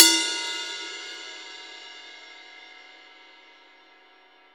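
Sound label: ride, Zildjian; Best Service; Real Mega Drums VOL-1; WHGM KIT 1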